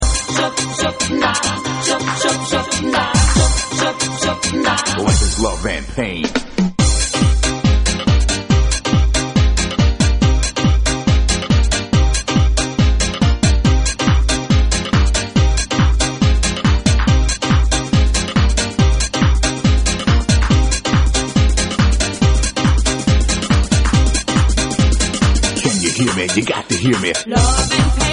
house pain radio mix

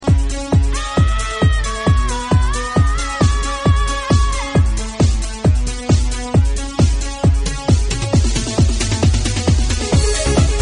new bass

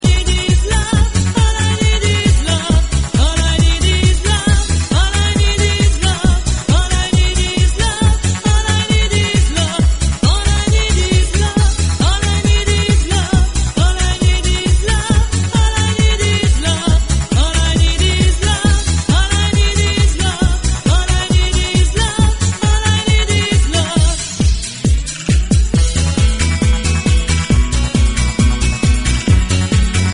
guitar mix

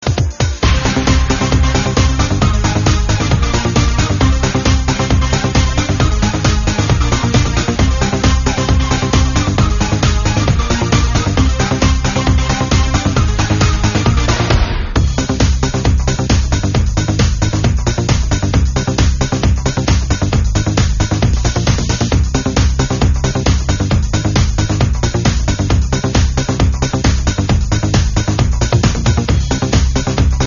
instrumental

